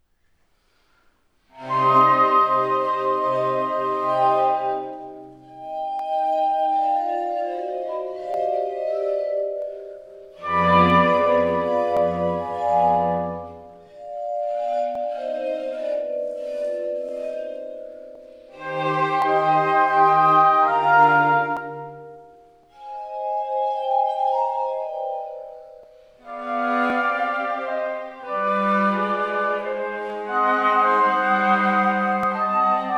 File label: Mozart Quintett Adagio Anfang